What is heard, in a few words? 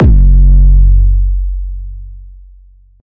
808 F#; Heavyweight; premium 808 sample pack